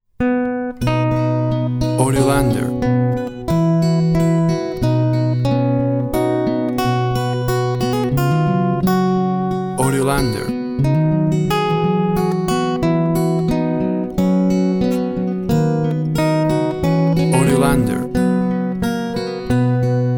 Acoustic Guitar

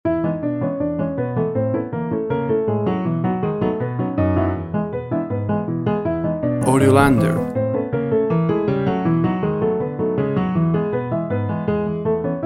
Clown funny Show

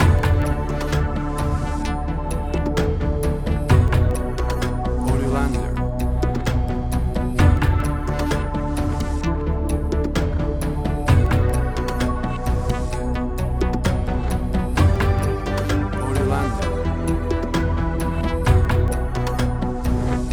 Atmospheric Synth Tension; Atmospheric Synth Tension. A grea; 30 SEC; AUDIOLANDER

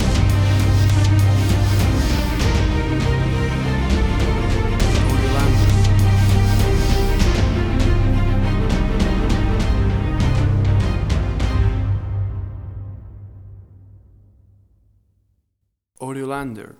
Big Powerful Action Movie Score

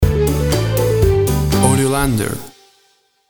Upbeat Kids Party Music Stinger

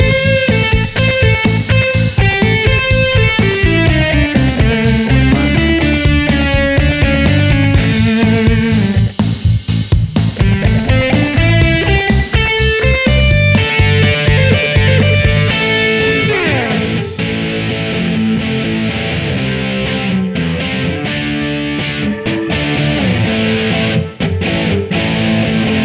Rock

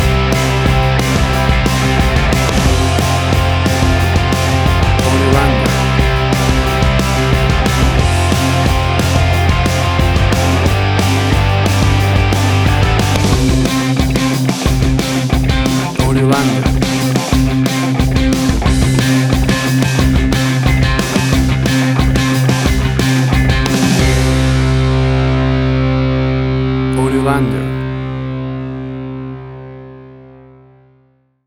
A Basket Case of Punk Rock

